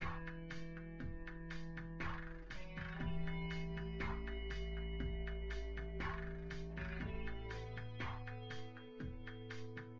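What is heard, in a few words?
with drums synth conv 5cm sim; SASSEC07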